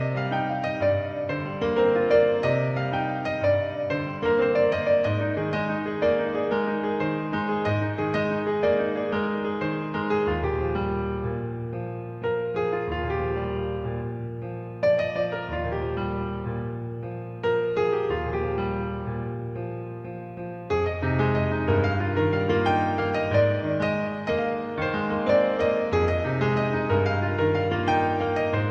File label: Relaxing Piano Music